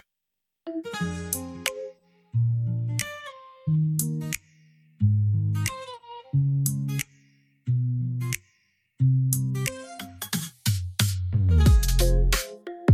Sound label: Instrumental